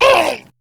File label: Pain, Battle Barks; Warframe; Grineer Ghoul Sawman